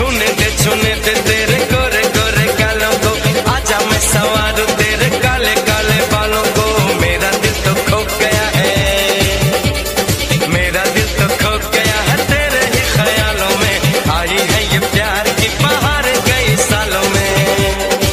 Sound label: new bollywood ringtone